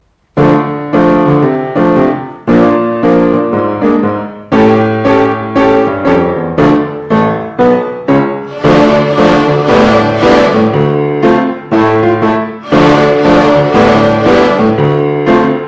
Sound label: yr4 group manhattan blues